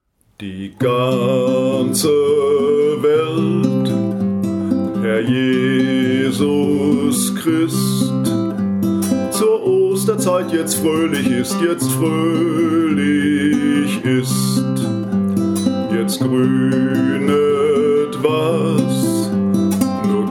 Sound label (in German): Zur Osterzeit, Gitarre